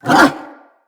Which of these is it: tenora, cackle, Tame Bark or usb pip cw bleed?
Tame Bark